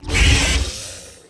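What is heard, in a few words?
death, ripper; giants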